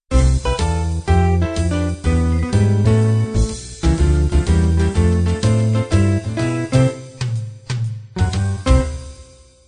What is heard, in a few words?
jazz quintet end